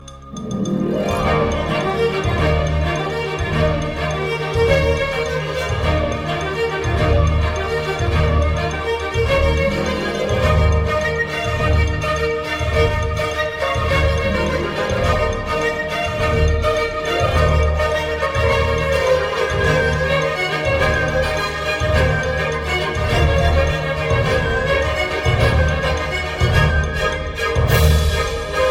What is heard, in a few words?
ringtone bgm; Harry poker weasley twins